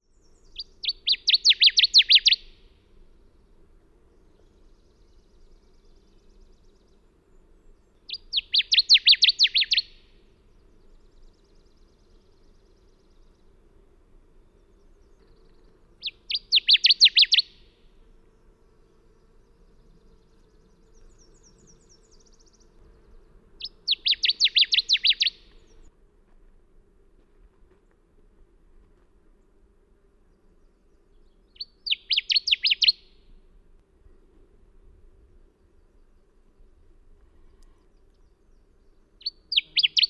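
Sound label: Warbler Connecticut Songs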